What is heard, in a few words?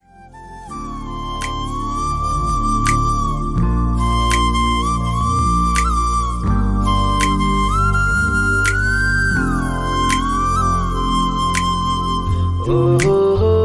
Flute BGM